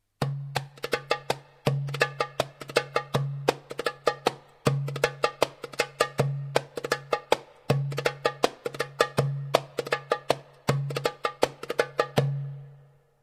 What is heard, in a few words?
darbuka; notations